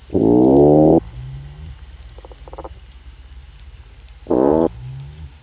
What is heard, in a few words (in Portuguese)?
Gravação Charrocos